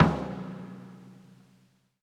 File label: Closed Hats